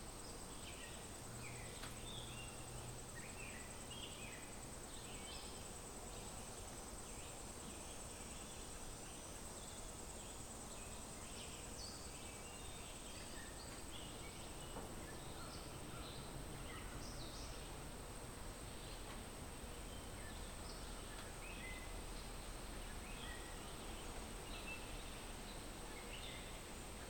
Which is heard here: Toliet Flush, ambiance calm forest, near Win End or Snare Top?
ambiance calm forest